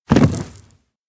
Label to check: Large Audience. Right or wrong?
Wrong